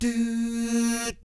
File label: DOO, Vocal Planet Jazz; ILIO; Jazz & FX; JAZZCOMBO